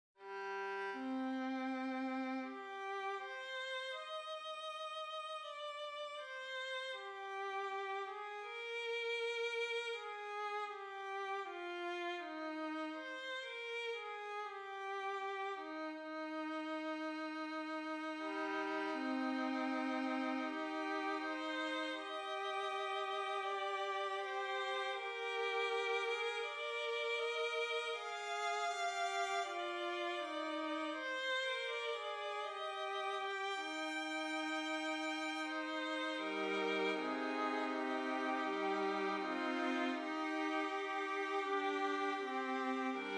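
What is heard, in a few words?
5 Part Canon with dynamics; Juan Garcia Music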